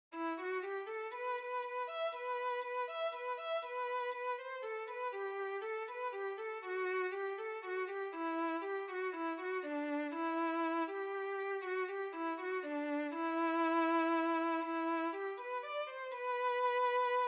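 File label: violin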